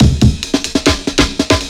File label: Fill 140 BPM